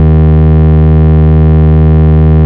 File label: Root D#, Bass